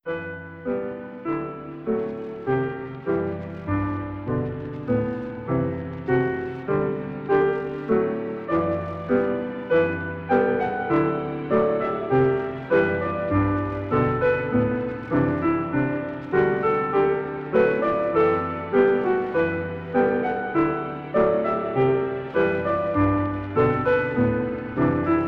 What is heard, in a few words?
Horror Music; juanjo sound